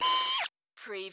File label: Servo Panel Sound - Servo